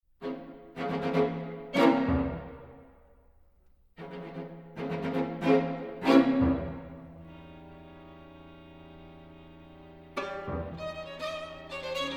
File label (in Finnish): Pianokvintetto Elegia; Sallinen; Op. 92